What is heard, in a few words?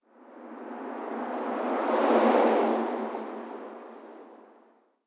car, ambient